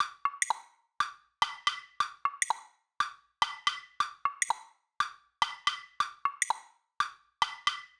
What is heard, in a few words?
Block Loop 120; Equipped Music; Loungin House; Perc Loops 120